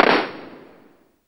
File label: SHOT FX